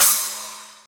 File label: splash, virtual drum sounds